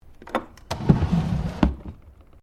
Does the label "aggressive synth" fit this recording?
No